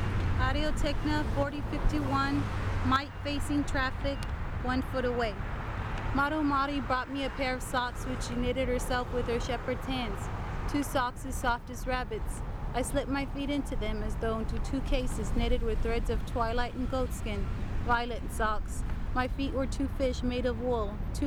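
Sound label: AT4051 1ft Mic Facing Traffic, Exterior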